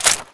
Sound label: scarh mag in; garrysmod